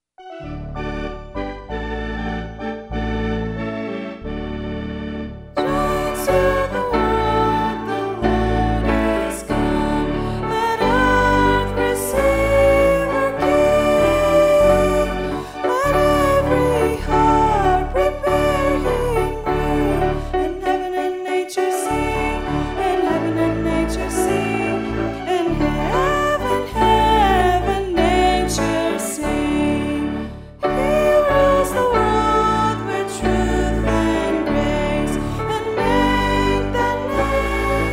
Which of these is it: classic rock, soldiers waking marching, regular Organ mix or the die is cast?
regular Organ mix